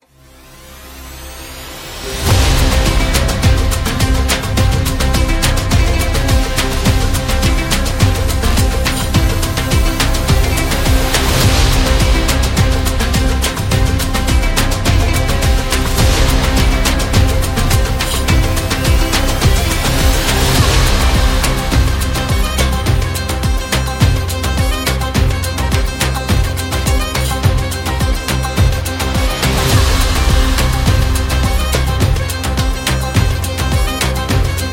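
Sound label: Middle East, epic cinematic trailer music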